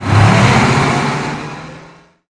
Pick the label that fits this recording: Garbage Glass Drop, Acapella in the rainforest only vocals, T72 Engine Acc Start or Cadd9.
T72 Engine Acc Start